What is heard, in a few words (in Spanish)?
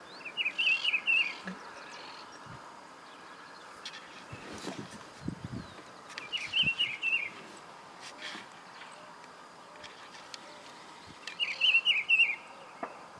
Juan Chiviro Voz; aves